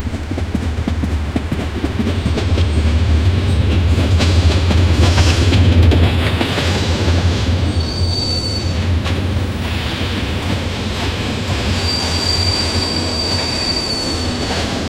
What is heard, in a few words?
overlay rhythmic train clatter